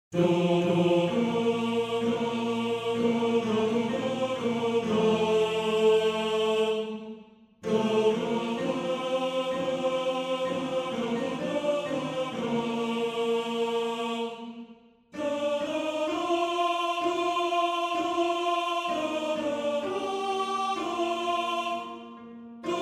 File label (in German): Tenor; Alles Leben; Tenor 1